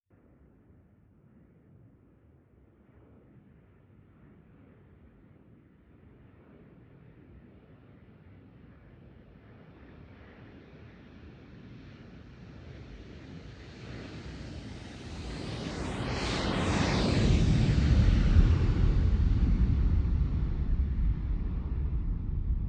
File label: jet